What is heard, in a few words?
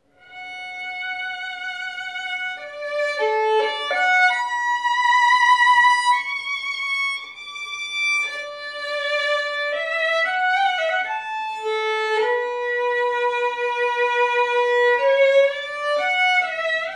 Meditation from Thais, Strad